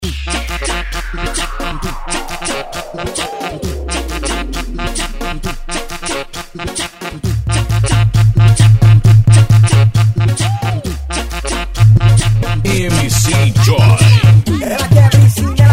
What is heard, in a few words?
bass